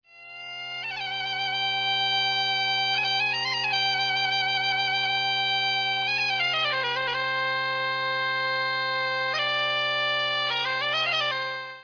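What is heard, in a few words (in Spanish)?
gaita; tradiberia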